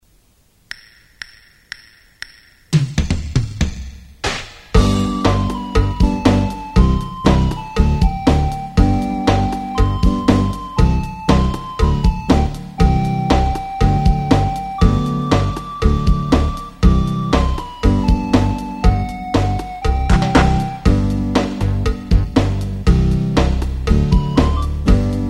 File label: karaoke